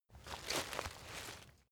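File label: step leaves wet sneak, footsteps; schritte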